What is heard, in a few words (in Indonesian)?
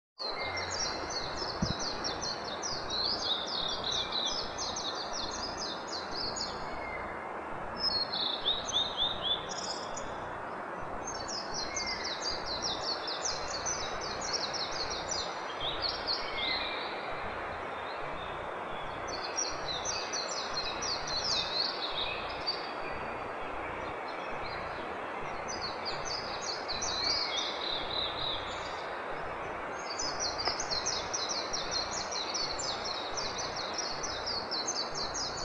peci liar ngalas